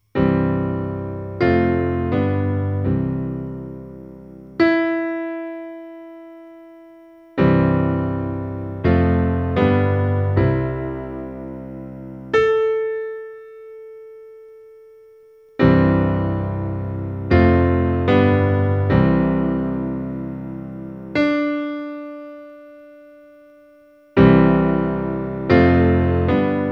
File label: Pitch Recognition (Major)